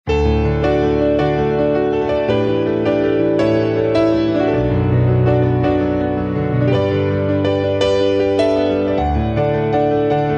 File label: Solo Piano